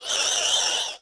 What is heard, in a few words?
moan